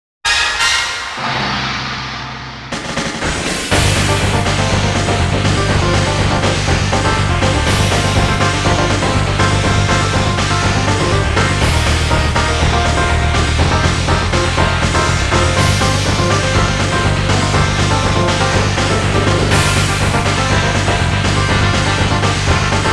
metal; Meta Crystal

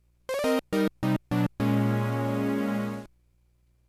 fail; nightmare

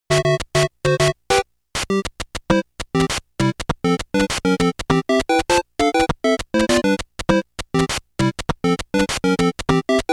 8bit

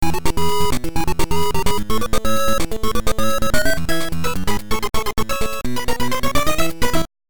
Score Milestone; pinball; HAL Sound Team

